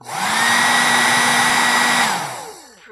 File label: Heat Gun Light Short